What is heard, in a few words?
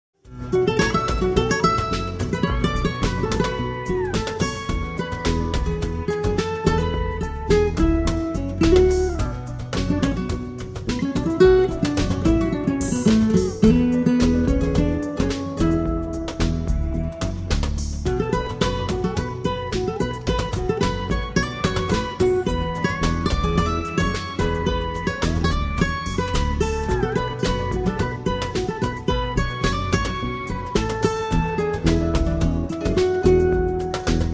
midi gtr solo